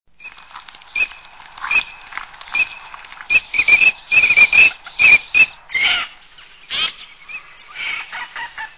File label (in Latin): Anas crecca; voice